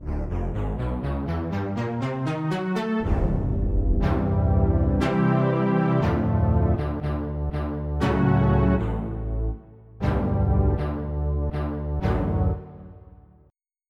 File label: All Claves; Onj's Soundfont